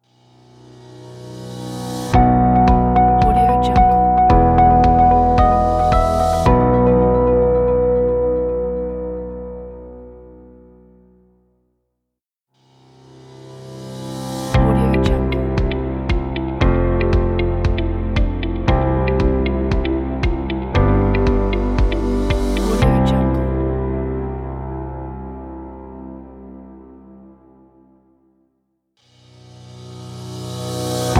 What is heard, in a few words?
corporate logo pack